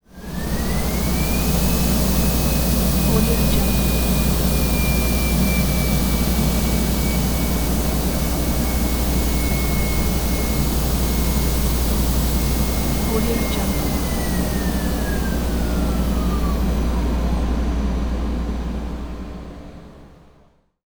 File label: motors source big factory printer start; robotic lifeforms 2; stop